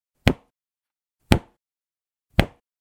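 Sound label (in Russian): звук резинки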